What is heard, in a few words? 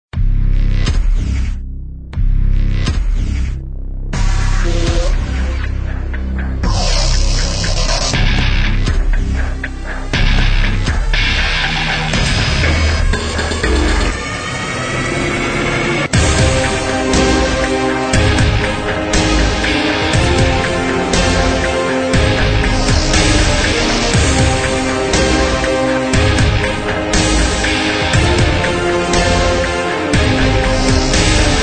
Epic Trailer Intro Ident Shorter